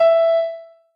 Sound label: noteblock e; mesecons